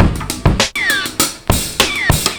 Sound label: 100 bpm, breaks; Zero-G Beneath the Planet of the Breaks